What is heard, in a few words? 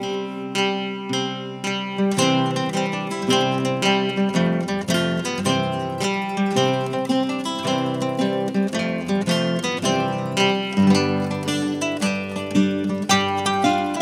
Music Cornucopia String; 7 Mages